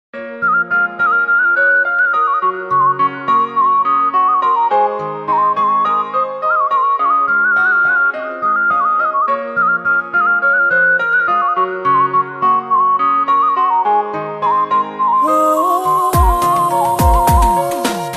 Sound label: romanian flute